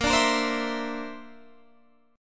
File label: D 7th Suspended 2nd Sharp 5th Bb